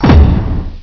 menu ent; CHASM

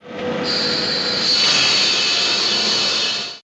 DROP BALLAST; Titanic Challenge of Discovery Panasonic WIN95

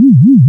Ship Noise; Captain Bumper 0.58

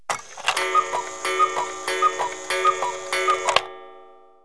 CUCKOO; KABOOM! - Wild and Wacky Sound Software

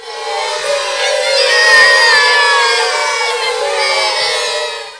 children boo

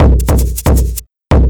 drum loop D+B 160; Drum+Bass

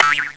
oing; dinoeggs

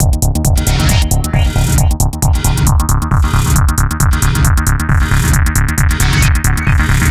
groovebox

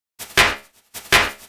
SNARE, Drum Bass, 160; eJay

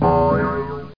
teleport; Catacombs

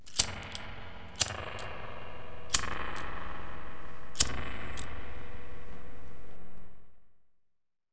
LIGHTER

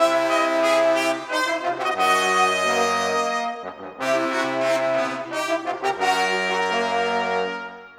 Modern Brass, orchestral; orchestral 2

